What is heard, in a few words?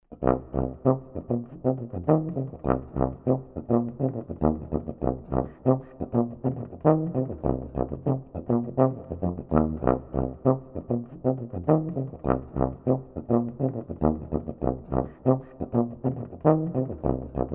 Funk tuba; Grundton, Oktave, Septime, Mollterz und Ghost Notes; Grooves for jazz tuba